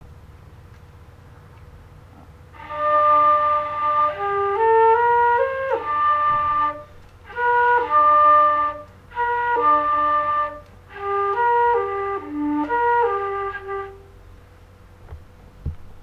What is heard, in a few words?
flute unknown classical